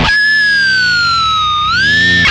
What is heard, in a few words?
whammy fx, guitar; 90 s Sample CDs; Zero G - Funk Guitar